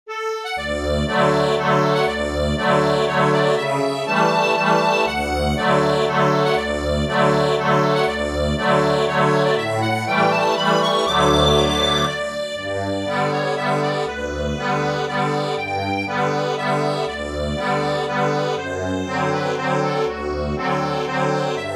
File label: Country Dance accordion